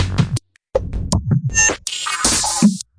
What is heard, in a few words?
160 backbeat electro interference; 141 to 160 bpm